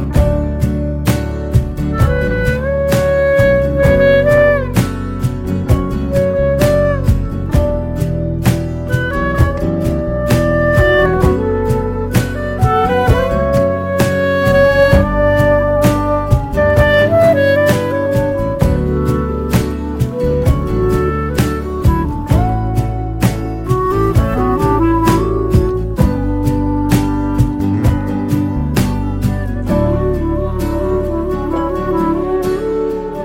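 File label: background music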